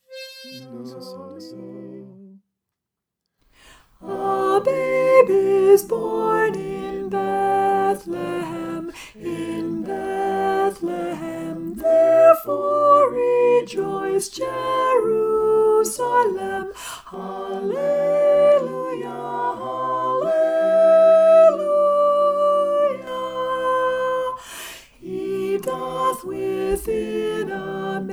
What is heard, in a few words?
a babe is born soprano